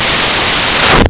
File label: Hand Bomber Proj Fire; Warframe; Man In The Wall